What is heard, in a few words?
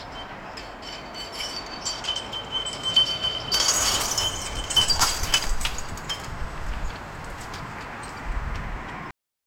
car speeding on ghat road